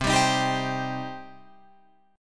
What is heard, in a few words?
C Minor C down Guitar Open F; Cm strummed